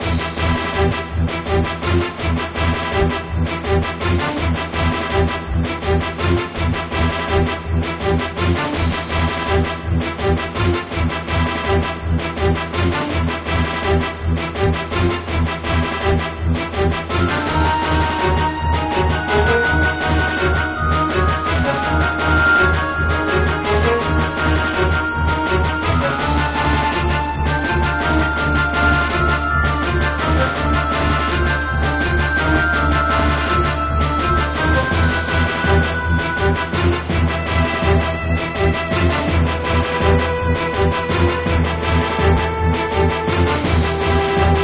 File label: battle; Mercenaries Saga 2; Android